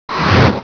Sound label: whoosh; cstrike; chaos